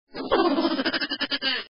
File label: hehe; hl2mp